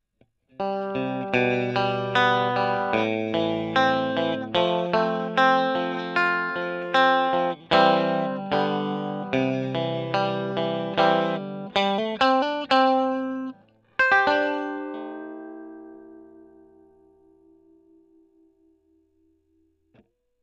E.GTR; Gefell

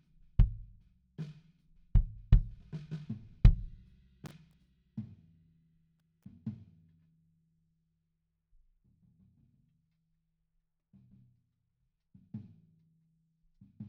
BD IN

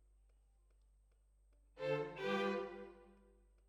Violins, jazz for piano

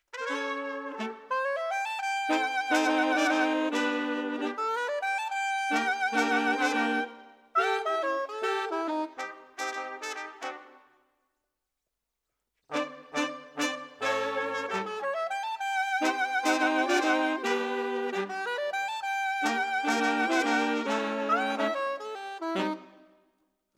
Sax

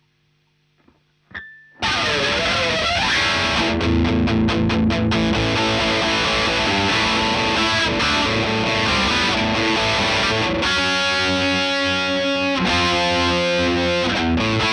Marshal Gtr

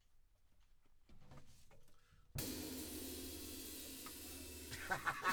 Drums

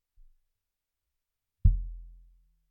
BD In

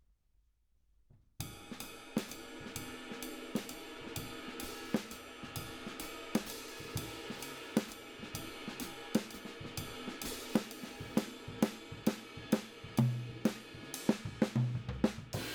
Drums

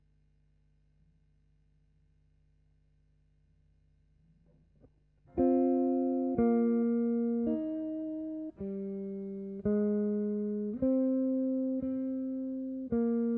GTR B

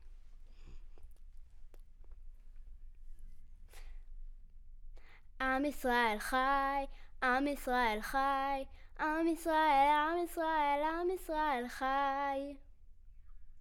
Children Choir; Studio Bekol Children Choir

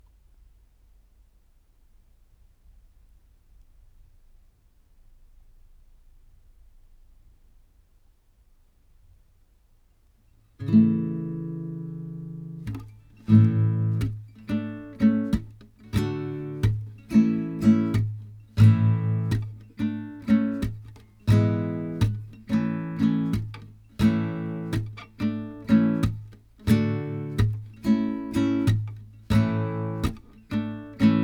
Tape GTR

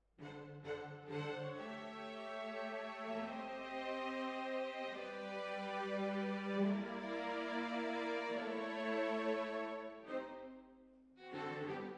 Hall

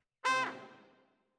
Trumpet

